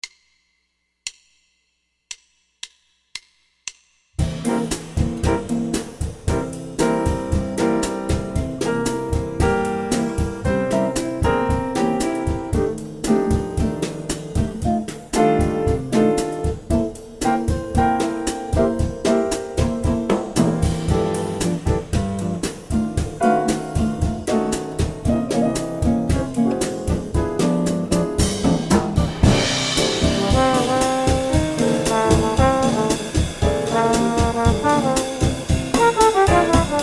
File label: 12 Bar Latin C 115; 12 Bar Blues Latin C 115; Bass Practice Tracks